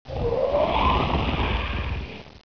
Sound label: growl hiss